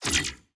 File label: ui broadcast hint